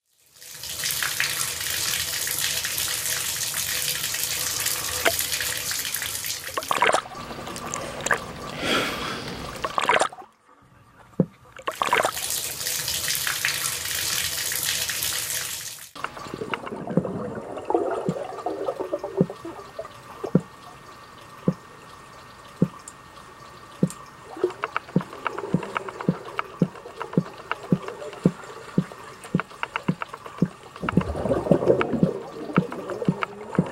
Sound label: Inhale Exhale